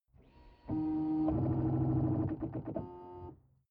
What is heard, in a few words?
sports car ext ignition onoff; verkehr-transport